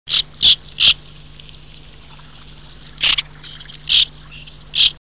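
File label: white-winged tern voice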